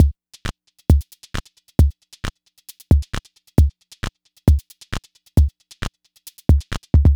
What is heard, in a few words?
Miami Bass Fuzz, Modular Electronica; Sonic Foundry (Sony Creative Software)